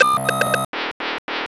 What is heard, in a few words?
aheli mine captured loop, attack helicopter; hl2 ep2